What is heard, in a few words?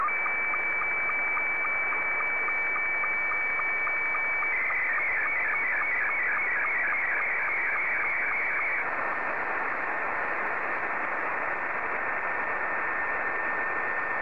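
SSTV, Scottie 2; VK4MSL SSTV RX @ QG62ln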